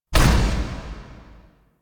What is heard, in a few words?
UI Mod Deactivate Turret Weapon; Player Ship; Warframe; Railjack